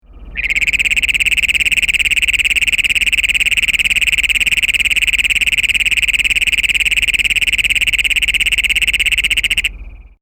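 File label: bcognatus, frogs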